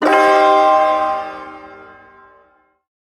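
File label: Shawzin Pentatonic Minor Chord; Pent Min Chord B; Warframe; Duviri Ersatz Shawzin; Chords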